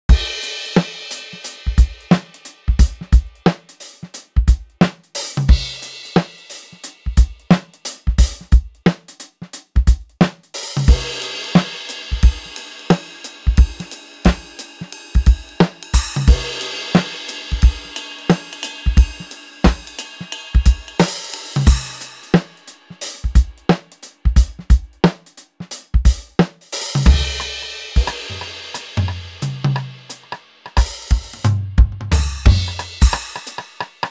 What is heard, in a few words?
efnote7 gentle notes